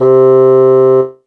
E-Mu Proteus FX Bassoon C3

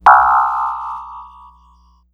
Yamaha PSS-140 Leaf Spring C3

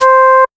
Yamaha TX81Z Flute C5

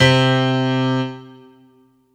Ensoniq SQ 1 Pop Grand C3

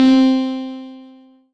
M-Audio Venom ClassicV C4

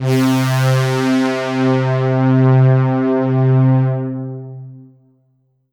Kurzweil K2000 Big Jupiter C3

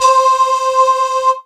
Casio CTK-611 Voice Lead C5

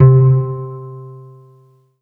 Kawai K11 Harp C3

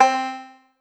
Kawai K5000W Banjo C4

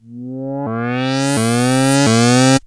Casio MT 600 Plunk Extend C3

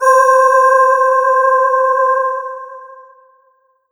Roland SC-88 Choir Pad C5

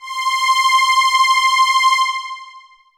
Roland JX 8P Hi Strings C7

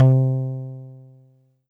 Kawai PHm Contrabass C3

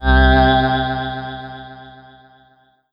Korg MS2000 Human Touch C2

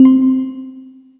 Kawai K11 Music Box C4

